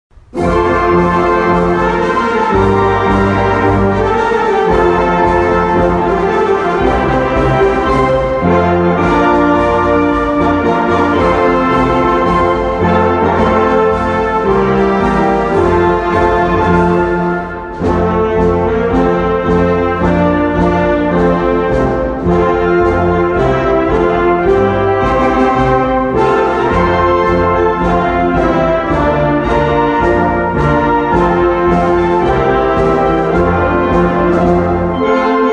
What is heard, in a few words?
school song instrumental